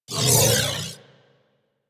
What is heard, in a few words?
Crewship Turret Proj By; Vehicles; Crew Ships; Weapons; Warframe; Rail Jack; Corpus Crew Ship